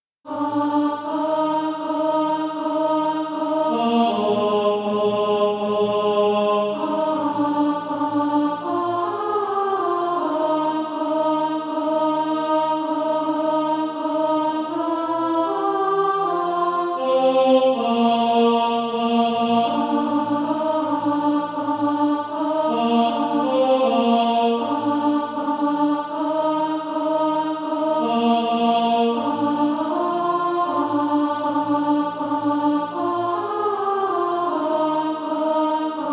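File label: alto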